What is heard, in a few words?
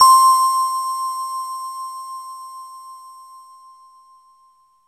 JV bell, JV-1080; Syntec; Wall of Sounds VOL-2; BASIC-JV1080